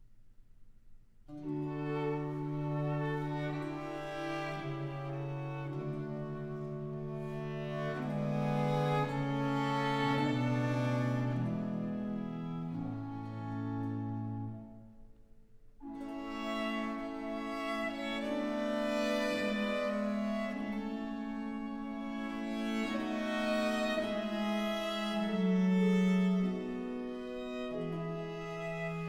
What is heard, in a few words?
Trio Sonata in B-Flat Major, Adagio - Vivace; Purcell & Blow